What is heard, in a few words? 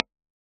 VX AC30 2x12 FULL V (Alnico Silver) R121 SM545